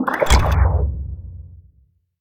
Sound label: Cockleshell Close; We Are the Dwarves